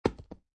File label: Poker Chip Dropping On Playing Cards; Poker, gambling. Poker chip dropping on playing cards.